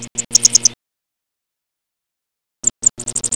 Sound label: BIRDSNG; ANIMALS